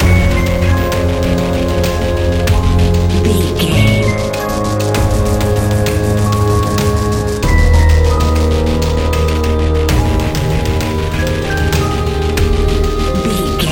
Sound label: modern horror; Video Game